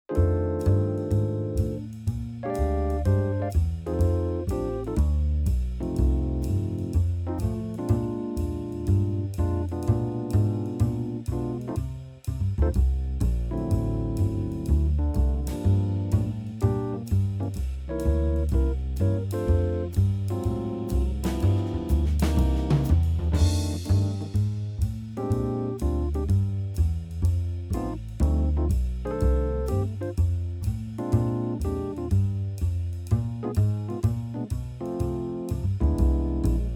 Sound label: Blues rhythm